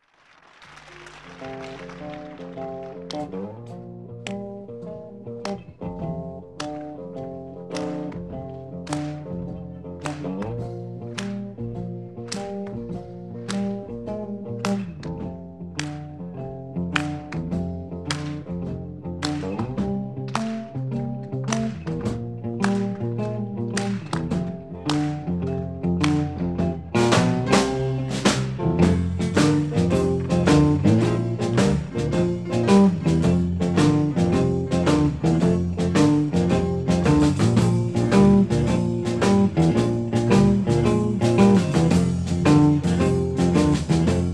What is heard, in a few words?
Honky Tonk